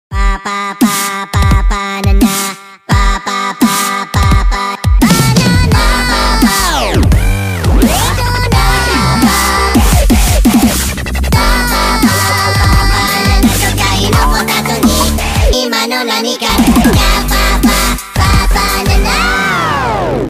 dubstep mix; minion

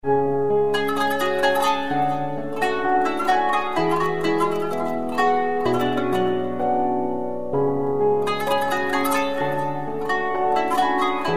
Kanun Pianos

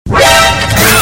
Alert; Mgs 1

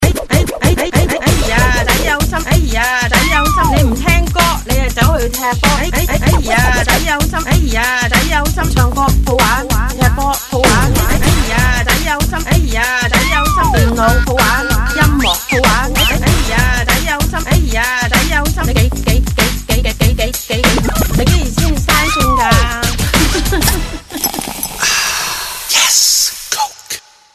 Chinese Ringtone

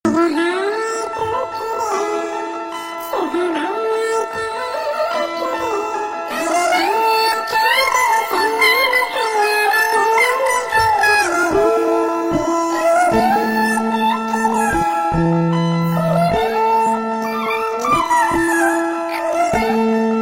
Chipmunk

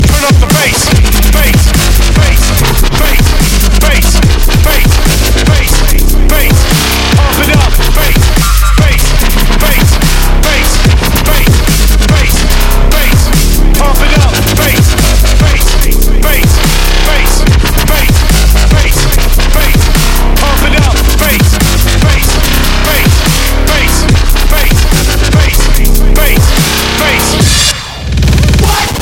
Dubstep Bass

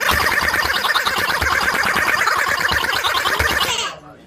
Dog Toy Squeaking

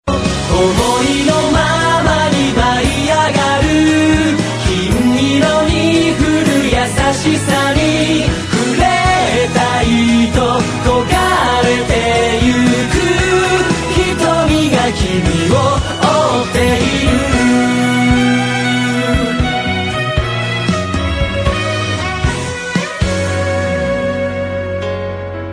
Quintet

